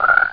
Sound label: toad; Ferazel's Wand Demo